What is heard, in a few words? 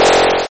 Trill powering